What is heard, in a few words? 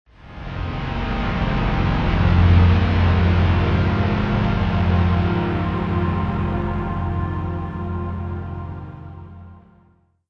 Modern Foreboding; Civilization Revolution; xbox